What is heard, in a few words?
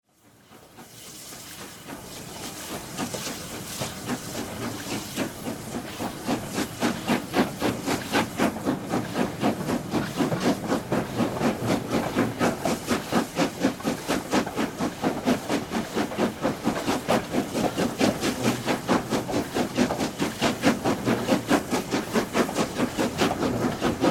Departing Goathland on train; S15 825